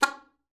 SLPB C#4, ALTOSAX; Xsample VOL-2

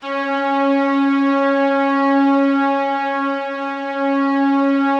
C#3, STRINGS; Yamaha VP-1; Music Store; JODYSTRINGS

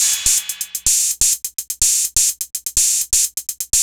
drum loop sixty hat 125, Classic Chicago House; Sample Magic

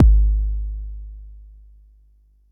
Bassdrum; Jomox Xbase09

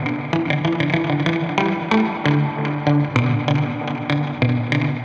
Strat Dub 95 E, Guitar Dub, 95bpm; musicradar; dub designer

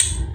Drum Machines, Roland MV8800, BREAK WILD KIT

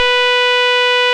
rave beep B3; Best Service Alpha Dance vol.2

